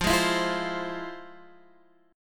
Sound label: F Minor Major 7th, Guitar Half Step Down, chord